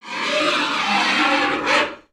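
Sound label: Pets Triceratops Neutral; creatures; Lego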